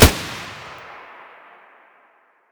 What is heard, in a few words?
sig550 muzzle distant; S.T.A.L.K.E.R.; IWP